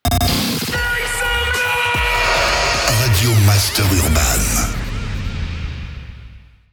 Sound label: JINGLE; RADIO MASTER URBAN; Habillage